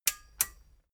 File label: metal button stereo click enable slow